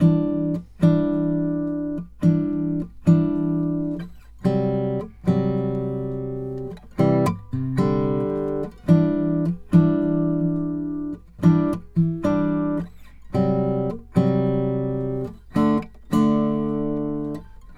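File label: Country Guitar, Verse 1 Alt; Digidesign; Pro Tools Essential Loops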